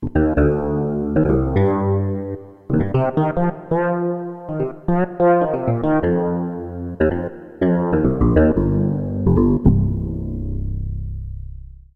Fretless

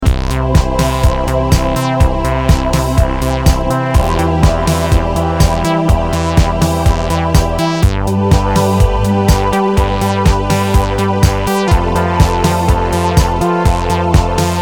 vp choir + prod + 707

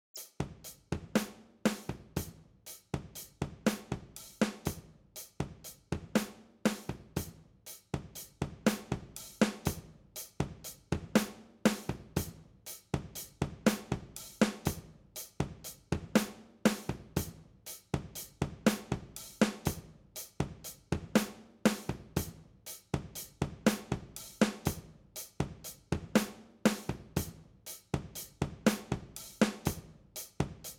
70s Transient

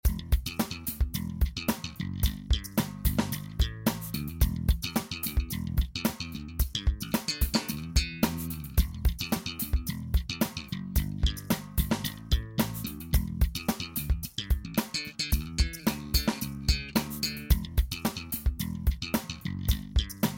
slap

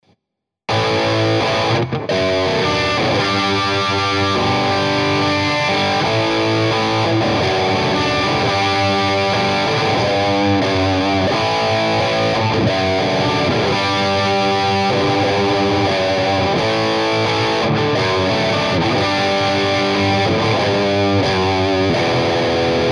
Orange Tiny Terror Rhythm; irig Micro Amp; Amplitube4

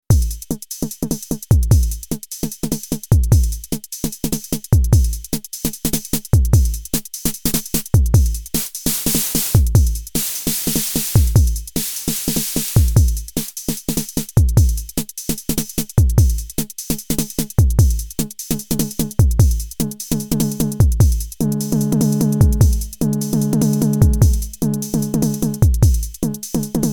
Snare

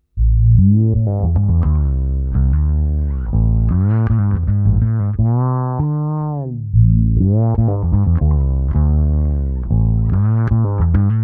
Ampeg Godin DI Slave parallel FX loop Bass IQ

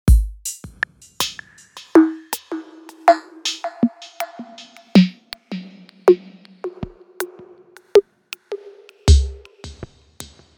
Tip Top Audio Circadian Rhythms Clock Reset

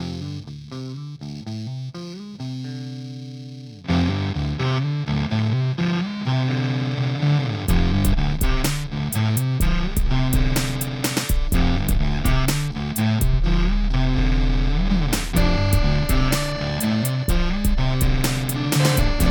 EHX Mainframe

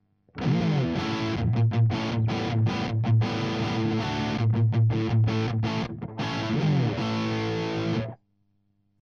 rhythm; C414

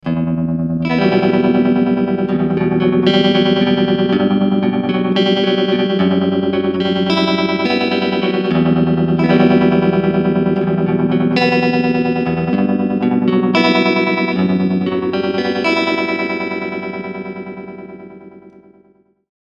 Varimolo

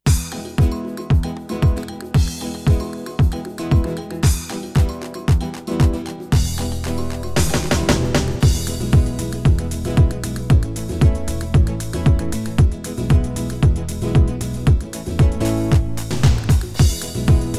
Korg i3 Style

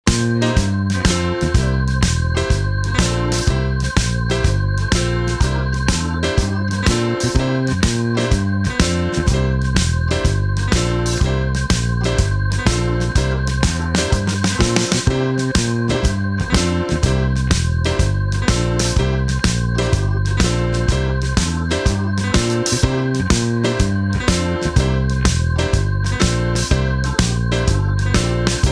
Shuffle

